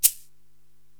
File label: Shaker, Drum Machines, Korg TR-Rack